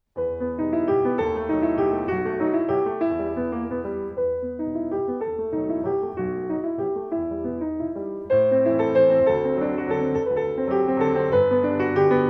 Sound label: Walzer E Moll